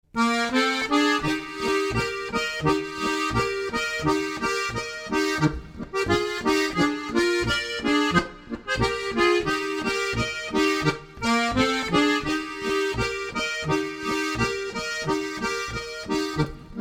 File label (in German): Boarischer; Schafe